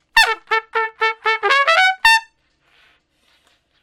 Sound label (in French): trompette originale; celemony; Melodyne Plug-In